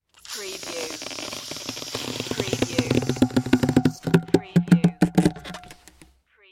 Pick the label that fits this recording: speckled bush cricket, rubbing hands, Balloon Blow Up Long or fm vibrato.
Balloon Blow Up Long